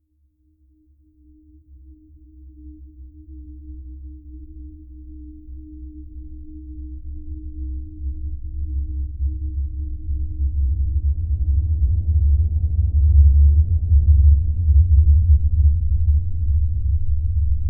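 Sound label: cosmic ambiance deep rumble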